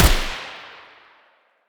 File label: muzzle g36 mono distant